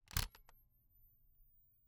Camera, Kodak Extramax, pocket, 110 film, plastic, metal, flash, switch on, power up; Eiravaein Works; Vaeyan IV